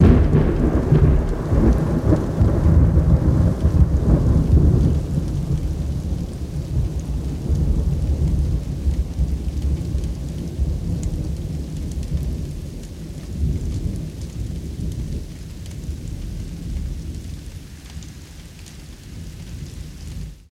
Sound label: Thunder Crack Low, thunder